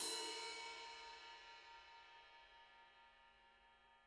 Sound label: RIDE, RIDE CYMBALS; Spectrasonics - Backbeat